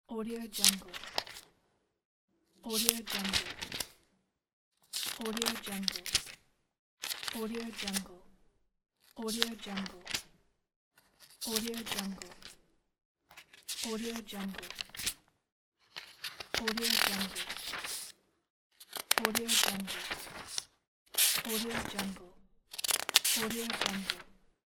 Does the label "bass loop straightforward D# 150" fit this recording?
No